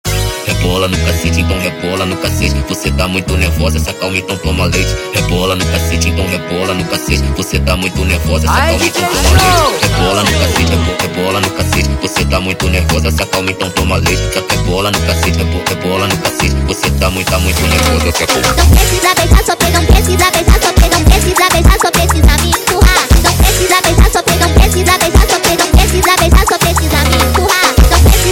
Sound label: sped Up, funk